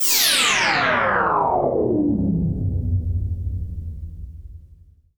Downlifter

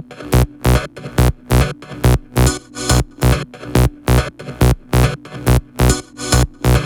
Melody, 140BPM; Genetic Melody 1

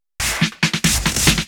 Loops Fills; Vengeance Samples

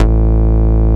Bass Root A

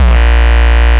Root A#, Ultimate Bass; Vengeance Samples

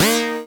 Synth Shot; Vengeance Samples

